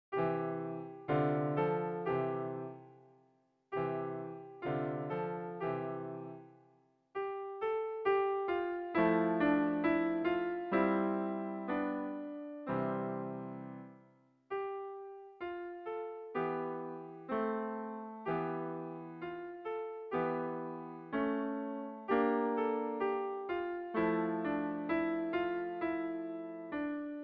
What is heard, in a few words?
Children s Song; libri di pianoforte per i corsi di base